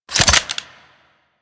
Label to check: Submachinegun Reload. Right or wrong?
Right